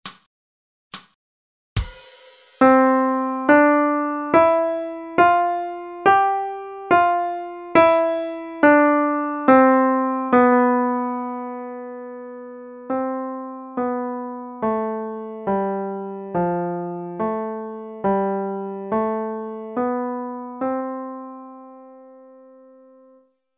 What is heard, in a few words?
Smooth Melody, Pianoforte; Giai dieu muot ma